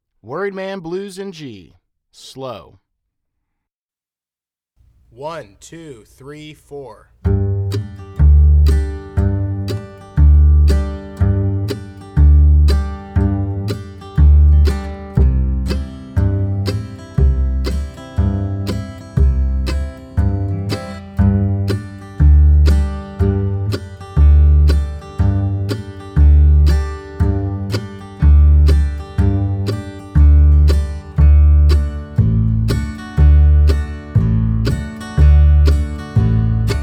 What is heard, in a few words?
Worried Man G Slow